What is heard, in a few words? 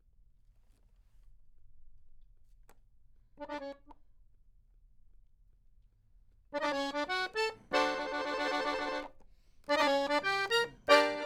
Accordion